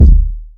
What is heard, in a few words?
solid steel kick drum sample D# key, Royality free kick drum sound tuned to the D# note